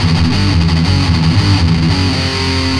Riff, Industrial; Nasty

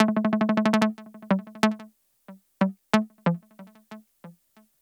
SYNT, Hip-Hop & R&B; USB Soundscan vol.47; AKAI

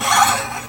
SQUEEK, Nylon Guitar

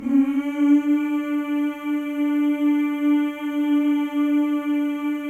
male; Spectrasonics; Symphony of Voices

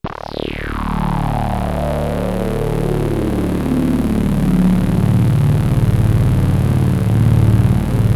T-WAVE, Synth Waves

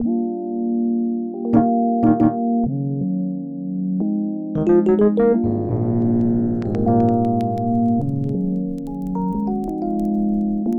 Melody, Hip-Hop

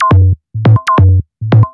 bass 138 F, bass-licks, Techno; instrument-loops

